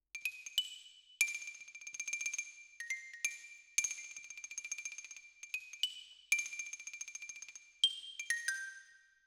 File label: Xylo